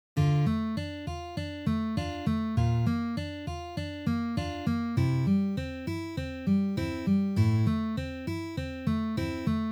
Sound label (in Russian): perebor